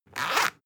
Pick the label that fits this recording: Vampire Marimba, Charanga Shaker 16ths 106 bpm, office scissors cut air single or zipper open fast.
zipper open fast